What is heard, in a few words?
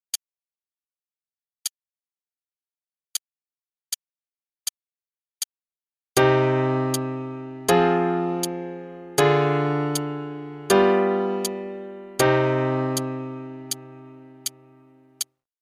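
c minor Chord Progression only (quarter note=80), i - iv - iio - V7 - i, RH common tone chords, LH single notes (root), with legato pedal; CM Level 5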